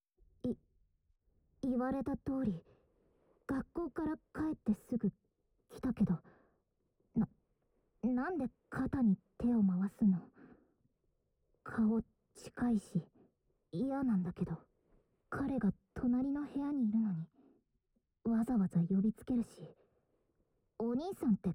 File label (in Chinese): 骑乘位性交, 隔墙音效; 恋在NTR骑乘位性交中扭动腰肢